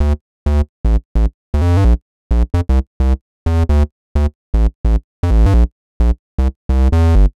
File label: Bass Loop 130 Bm; Bass Loops 130bpm; Downtown House